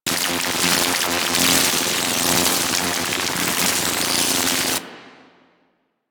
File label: Microwave Pistol Projector Loop; Grineer